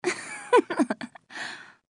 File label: laugh